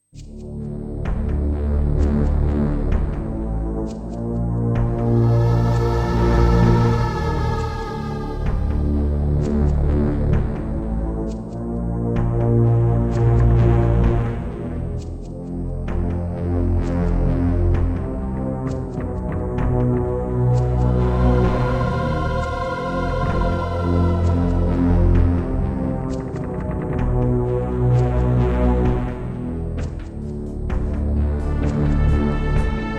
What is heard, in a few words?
Turtle Beach Rio; Descent II